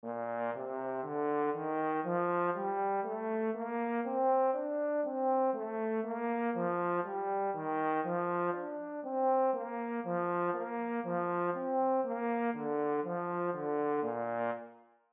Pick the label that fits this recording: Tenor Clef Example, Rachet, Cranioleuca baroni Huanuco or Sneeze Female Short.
Tenor Clef Example